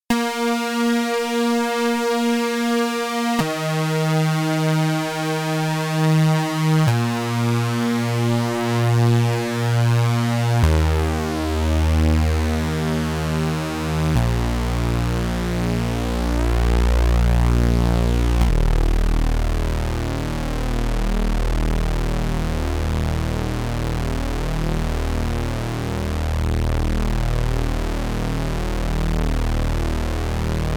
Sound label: 6 VCOs saw